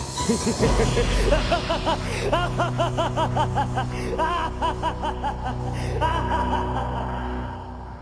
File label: cackle; Kaiba